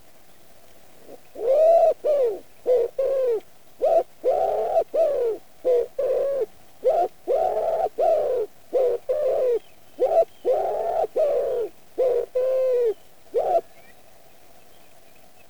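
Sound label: wood pigeon columba palumbus; vyahir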